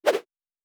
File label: Whoosh; Fantasy Sounds Bundle